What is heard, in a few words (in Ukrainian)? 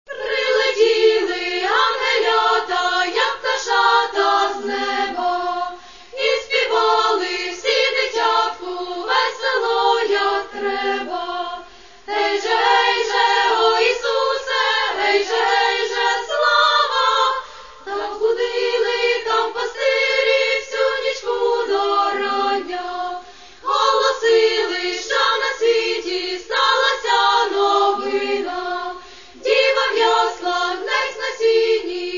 koljadky i shchedrivky